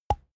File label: pop simple; koerpergeraeusche; comedy-cartoon; soundpool